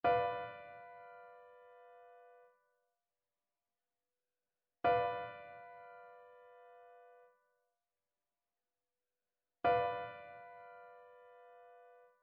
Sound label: trichord; trichord identification